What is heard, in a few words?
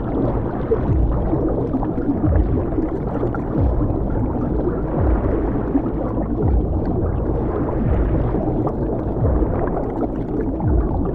Underwater Loop; Edens Ultimatum